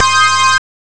synth pro moll, Amiga Soundtracker Sample; Amiga Soundtracker Sample Packs

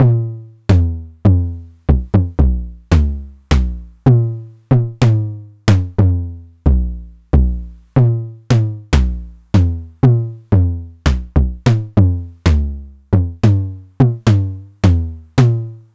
909 mod LT - LOW TOM

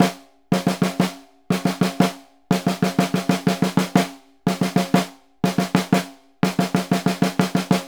SNR WR PTN, Synclavier Percussion; ILIO; Synclavier Percussion 2; World & Orchestral

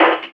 SD, Drum & Bass, 135 BPM; Ueberschall; Drum & Bass Flash Trackz

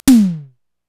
TOM, Korg EMX-1 drum machine; 300 Drum Machines

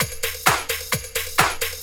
drt 130 addon, DRUMLOOPS, LOOP DANCE

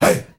HEY HEY; Heart of Africa; Spectrasonics; Heart of Africa 1; CH. SHOUT2

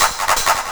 PERC, PERC LOOP, Disco House; USB Soundscan vol.20 - Fresh Disco House I

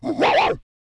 BARK, MALE HUMAN; Spectrasonics Vocal Planet

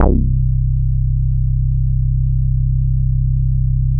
Bassnote, Prototechno; Sonic Foundry (Sony Creative Software); Basses

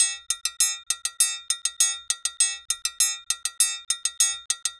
Cajun Triangle; Sonic Foundry (Sony Creative Software); Joe Vitale Latin Percussion

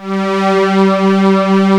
VS STRINGS; AKAI S-Series CD-ROM Sound Library VOL-8

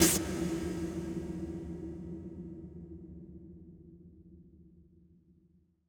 phreaky fx, Snares; Trance Explosion Vol1

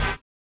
collision; Spectrasonics; Metamorphosis

